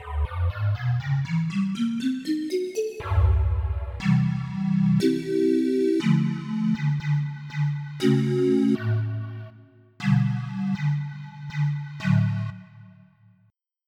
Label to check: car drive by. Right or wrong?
Wrong